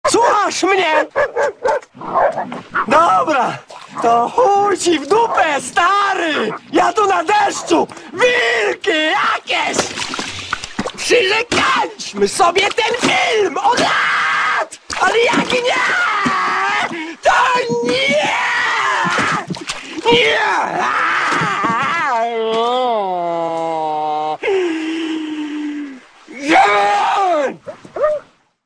classic string

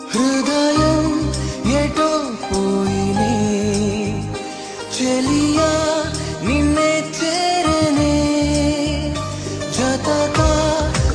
Happy Melody

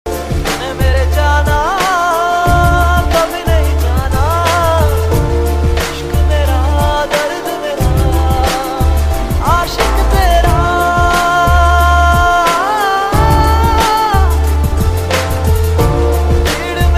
emptines hip hop mix

